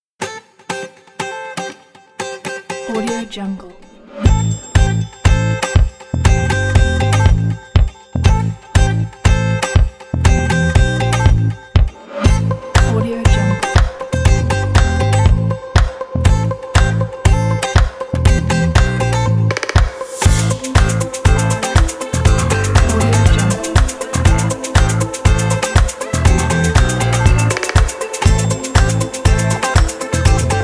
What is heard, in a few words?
fashion shoes intro